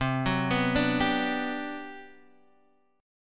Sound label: G Major 7th, C; arpeggiated; JGuitar